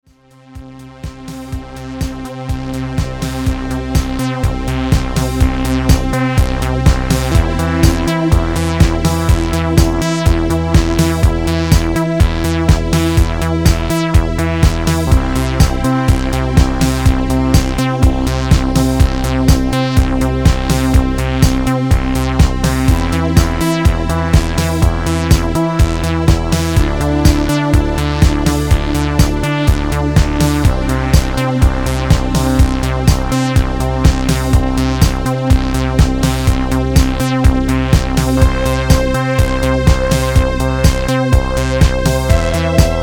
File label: vp strings 707